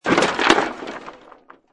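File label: Impact Wood Metal Cement, Junk crash; Wood Sound Effects; Lynne Music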